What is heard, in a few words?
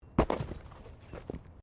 Body movement Impact Roll Gravel; Body movement foley to simulate falls and deaths; Rolling; Objects; SoundEffects-Foundation1